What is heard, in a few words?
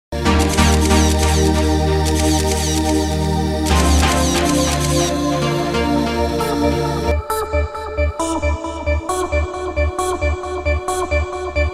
Trance Sounds